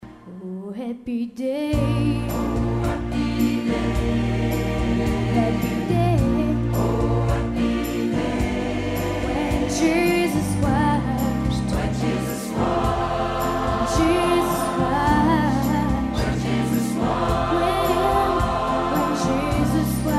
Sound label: Oh Happy Day SATB Solo